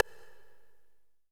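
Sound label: perc, percussion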